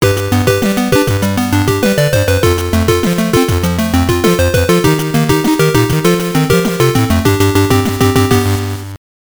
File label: Lose; Ronaldo V-Soccer; GB